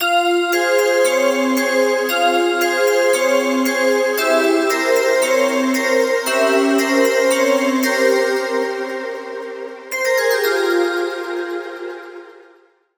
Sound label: bells game over